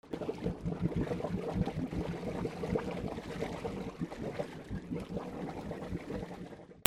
BOATS

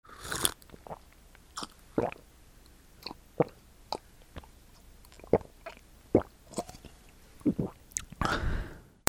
PEOPLE

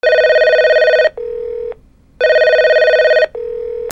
PHONES

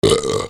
CARTOON

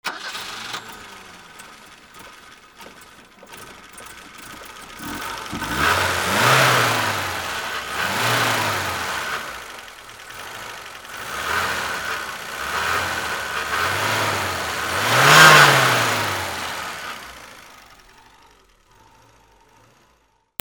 CARS

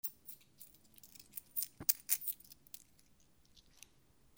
MONEY

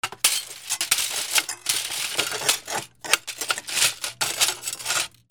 BREAKING

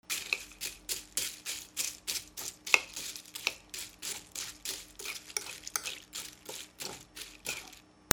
KITCHEN

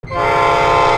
TRAINS

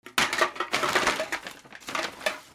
FALLING

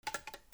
CLICKS

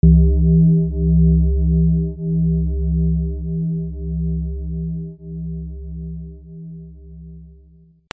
BELLS

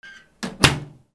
BATHROOM

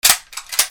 WEAPONS

